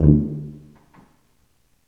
TUBA, Tuba stacc; Roland L-CDX-03

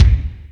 Lotsa Kicks, Drum Machines

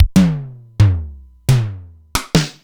Simmons SDS-9 Factory

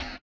slip slap; Spectrasonics; Metamorphosis

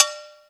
COWBELL, Ragga & Dub Reggae, BELLS KIT; AKAI; USB Soundscan vol.27

